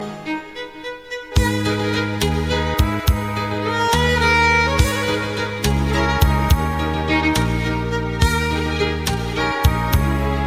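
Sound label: Skripka, violin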